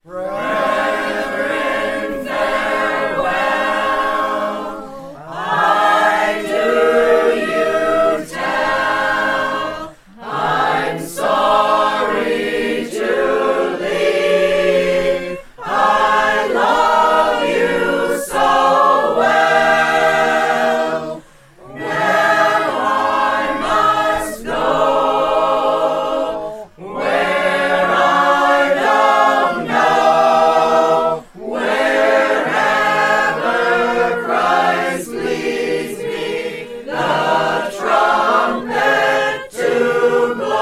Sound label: Sacred Harp Singers, live; 347 [Christian's Farewell]